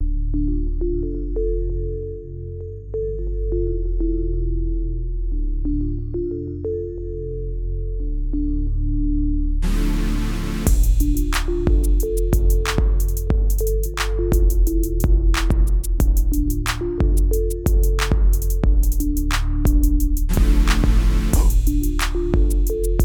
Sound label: Ominous Trap Beat